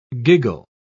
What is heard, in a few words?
giggle